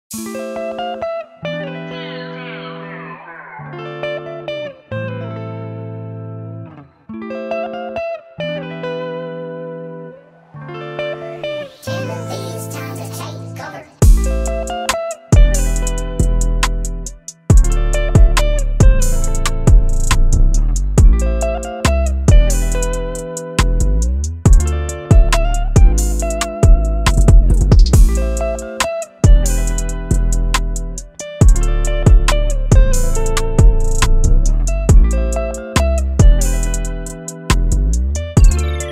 Guitar Type Beat

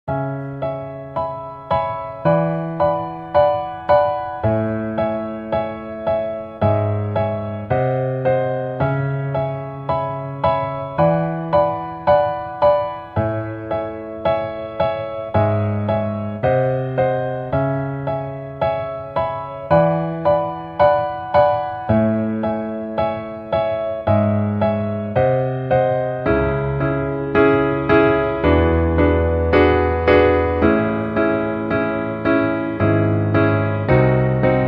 Piano instrumental

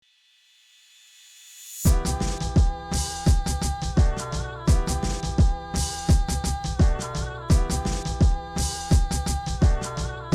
Instrument

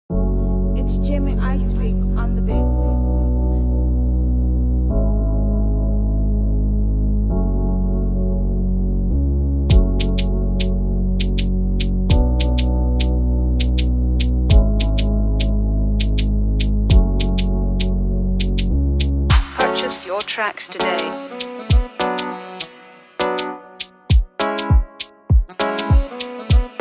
Afrobeat Instrumental; Prod by Jamie Icepick

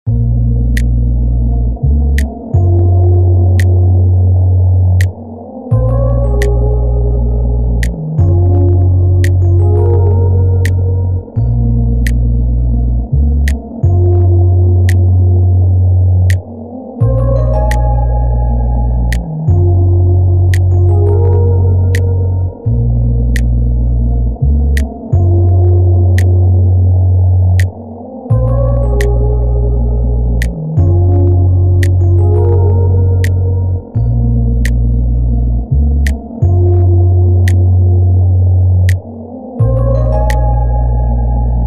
Interlude Instrumental

